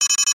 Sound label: Lock it Bomb Finished; narcos